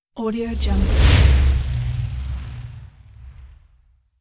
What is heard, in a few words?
fireball whoosh pass